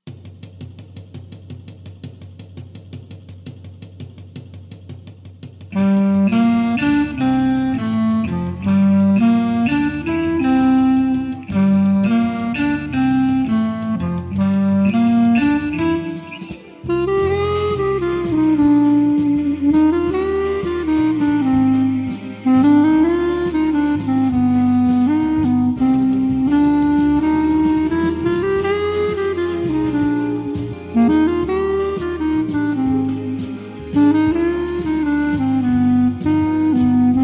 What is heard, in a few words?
clarinet